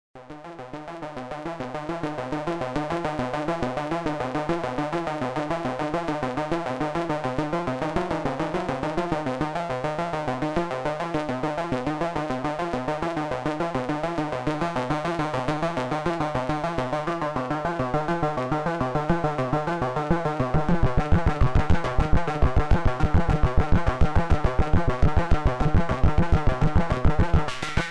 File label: ARTURIA MiniBrute 2